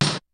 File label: kicks snare, kid; Kid Nepro; Elite; KICKS SNARES